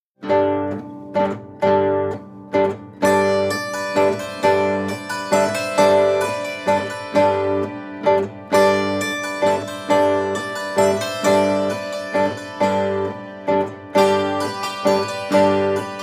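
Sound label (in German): Spielmusik Religiöse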